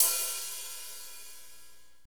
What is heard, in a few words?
HAT, Roland; Northstar - Drumscapes Roland